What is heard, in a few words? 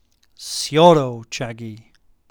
Sweeping Kick